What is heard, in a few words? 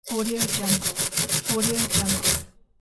brushing